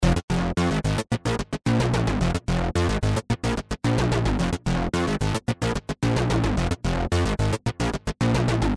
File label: loop